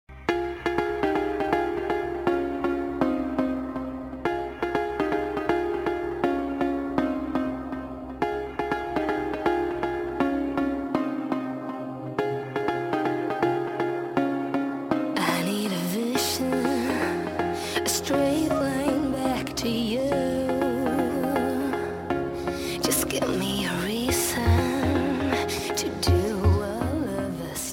trance

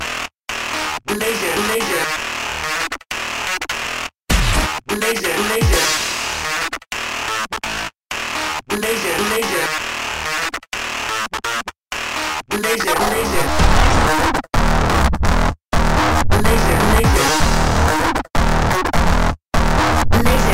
laser shock techno

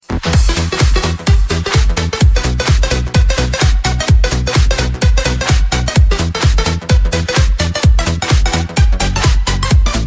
opera trance

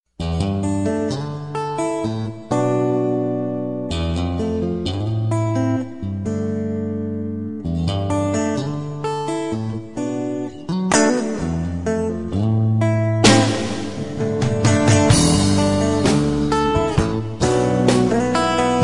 tender guitar

